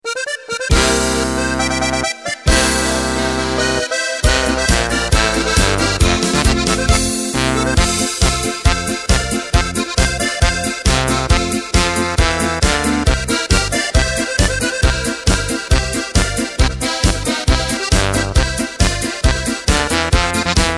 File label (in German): Playback mit Drums